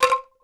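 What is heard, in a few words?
ethnic percussion; Crypton - DrumBase5000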